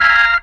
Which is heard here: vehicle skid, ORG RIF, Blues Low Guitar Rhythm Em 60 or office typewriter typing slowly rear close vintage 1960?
ORG RIF